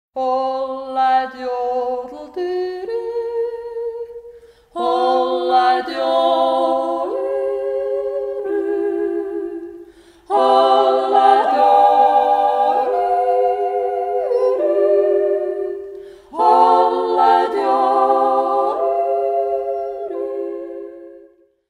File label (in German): jodler